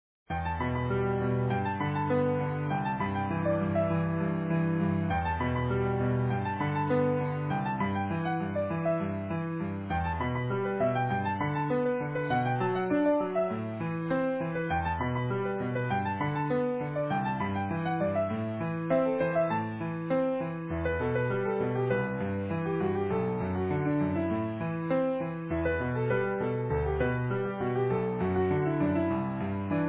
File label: easy piano